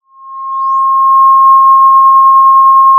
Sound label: Sound of a police car siren